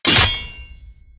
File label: Hit Inorganic